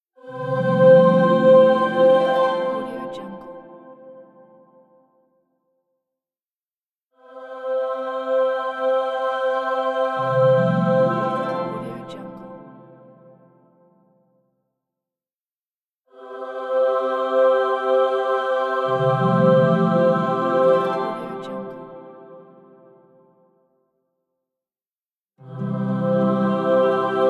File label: heaven angel choir